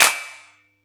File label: Yamaha EX5, Drums